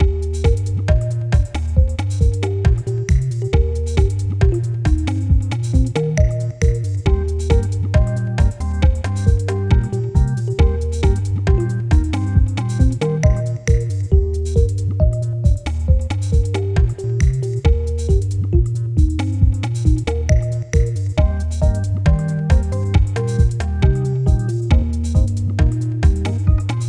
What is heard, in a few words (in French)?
musique; MOBICLIC 48; Demo Atlas